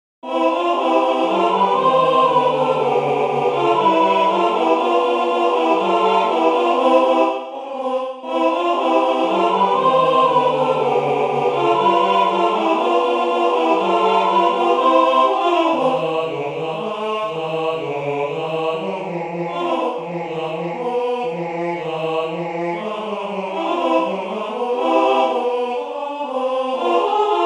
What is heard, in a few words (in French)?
Aragon et Castille tutti